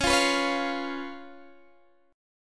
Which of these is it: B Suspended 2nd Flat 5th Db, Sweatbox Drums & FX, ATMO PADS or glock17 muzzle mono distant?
B Suspended 2nd Flat 5th Db